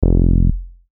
Bass Shot, F; Unison Artist Series; Tchami "Eternal Sounds" Vol.1

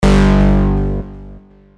Bass Shot (G); Unison Artist Series; Mac Depulse

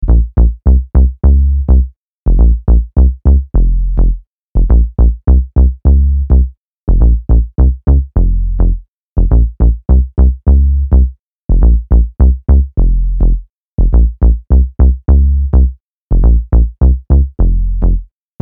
104BPM Fm, Bass Loops; Unison Essential Bass Loops

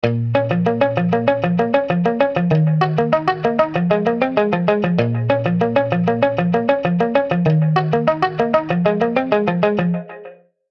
Guitar Hyper; Unison Omnisphere Essentials

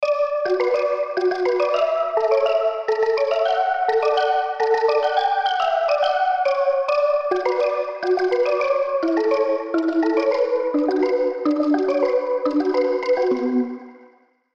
Crush Bm 140, Hardstyle Hardcore MIDI Melody; Unison Hardstyle & Hardcore MIDI Melody Collection

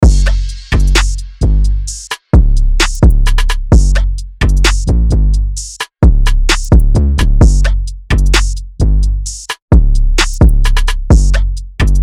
Drum Loop 130 BPM Gbm; Unison Beatmaker Blueprint

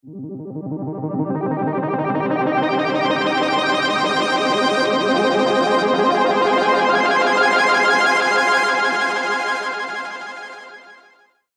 Atmos, Techno, Serum; Mushrooms; Unison Techno Collection for Serum

